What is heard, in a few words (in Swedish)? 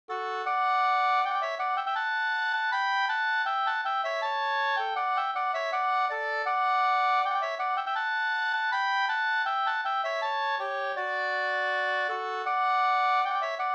brudmarsch; fr Norra Finnskoga; u Nils Stalberg